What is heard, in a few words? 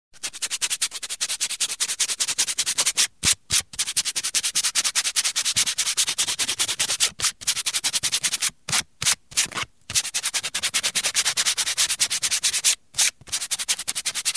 person sanding wood; SOUND EFFECTS1